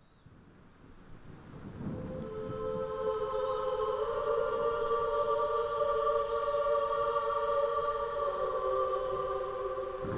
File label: nature soundscape